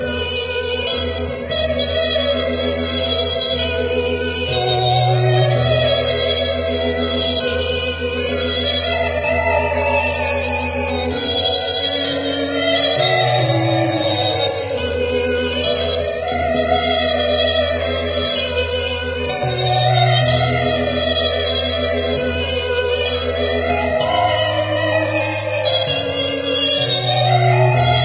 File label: witch encroacher bride, music; Left 4 Dead 2; Source Engine